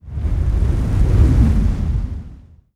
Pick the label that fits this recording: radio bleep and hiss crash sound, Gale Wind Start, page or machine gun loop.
Gale Wind Start